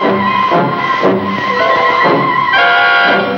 Ancient Music FX, Industrial

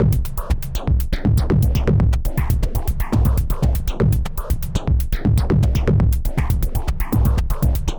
Anabeat Mix, Beats

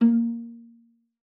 A#3, VIOLA PIZZ; Xsample VOL-5; 90 s Sample CDs

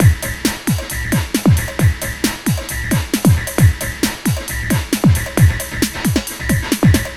Beat, Drums & Percussion; Electrocution; Beats

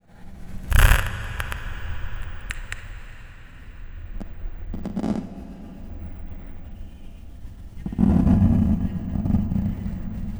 Cave Of Doom, Strange Ambiences; Sonic Foundry (Sony Creative Software); The Electronic Music Manuscript Collection

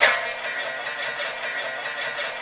Biwa, Loops & Phrases; Roland BK-5

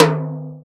TOM, Ensoniq ZR-76, Drum Machines

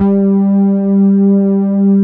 MUTED PADS; Z1 PADS; Pro-Rec - Synthsonic

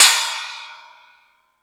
Hip Hop, Drum Machines

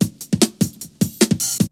BREAKBEATS, TECHNO

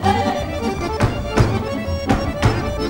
ACCORDEON; Best Service; World Colours; ACCORDEON+ST

